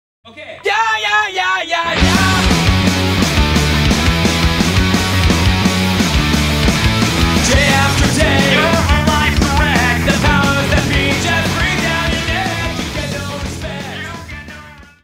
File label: escape success; humans